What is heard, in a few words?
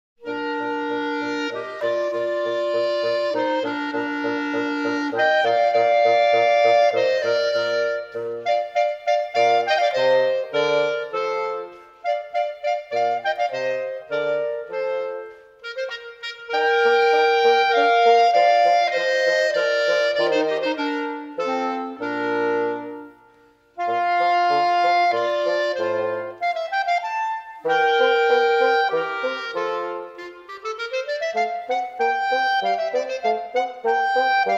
Andante